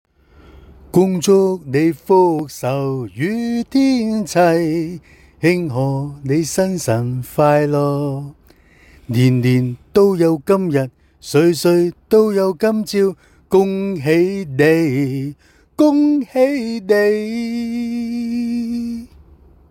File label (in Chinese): 祝壽歌